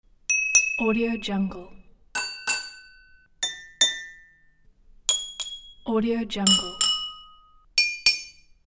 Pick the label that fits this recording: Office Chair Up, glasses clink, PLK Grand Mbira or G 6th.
glasses clink